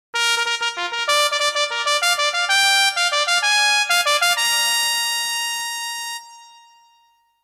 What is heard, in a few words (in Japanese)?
ラッパ1本のファンファーレ; Monster Farm 1 2